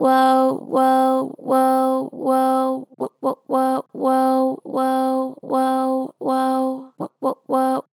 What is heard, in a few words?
"Woah Wo", Harmor Vocals, Pitch Flat Strong